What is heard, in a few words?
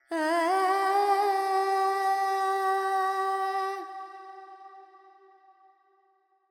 Carnatic Female Alaps, Panthuvarali, 100 G, FX